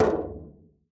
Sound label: metal, steps; VoxelEngine